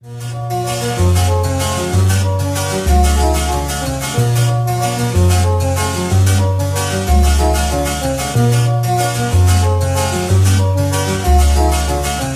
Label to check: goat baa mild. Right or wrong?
Wrong